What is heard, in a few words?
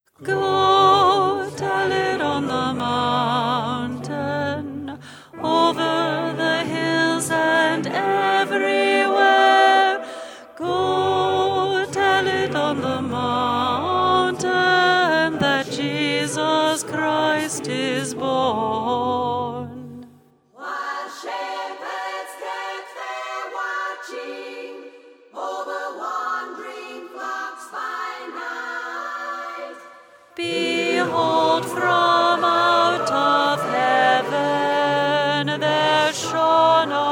go tell it alto